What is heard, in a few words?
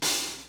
Hihat Open